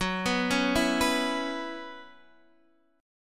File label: F sharp 7th Suspended 4th, Guitar, Open D